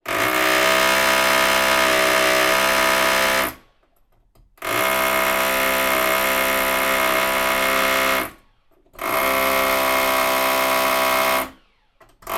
industrial drilling wall floor below

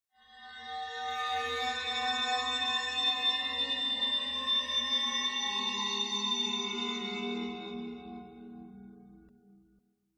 metal friction designed; luminous; silverplatter audio

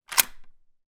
weapon gun 1917; still north media

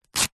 foley clothing rip short sharp fast

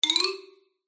cartoon xylophone short fast ascend; zapsplat

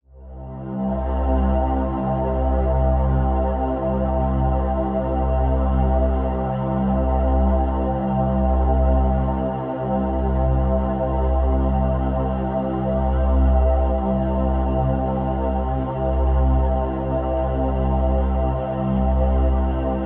Atmosphere death awaits; sumo blanco

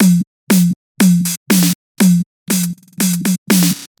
Filthy Percussion Loop; soundbits

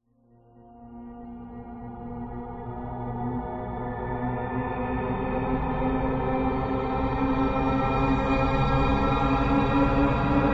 horror riser slow tense dark mysterious

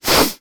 human man sniff

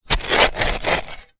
industrial shovel movements twist in dirt soil; zapsplat

